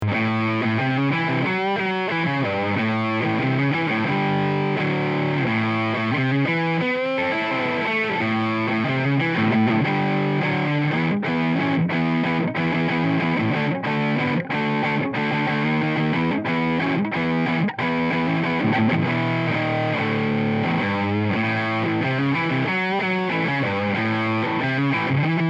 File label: Hyper Vintage Neck Distortion